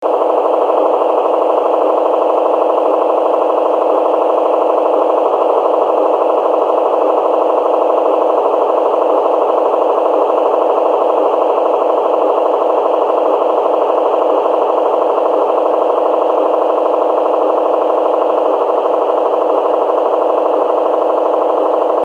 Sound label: Kenwood NEXEDGE Narrowband Control Channel; This is the control channel used by Kenwood's